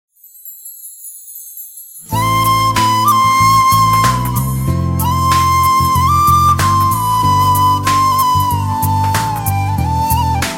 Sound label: Flute Theme; Telugu; Jagannatakam (2015)